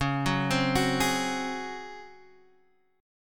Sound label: Db Major 7th Suspended 4th Db down; arpeggiated; JGuitar